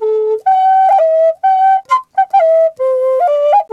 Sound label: didgeridoo riffs; Big Fish Audio - Didgeridoo and Other Primitive Instruments; FLU RIFFS 1